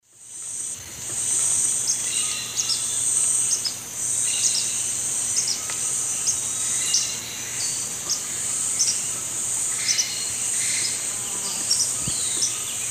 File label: Myiobius atricaudus, calls and begging calls; S. America; Eastern Lowlands